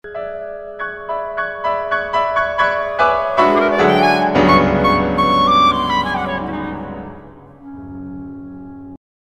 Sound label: Clarinet